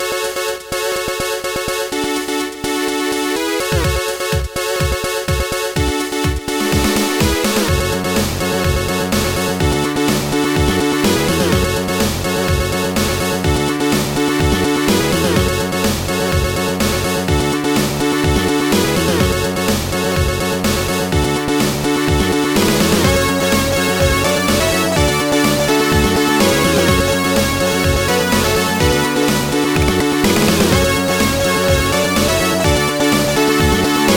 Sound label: instrumental noise; Trackers; Modland